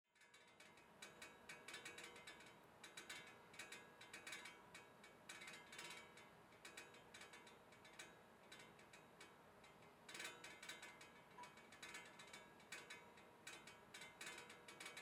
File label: pipe rattle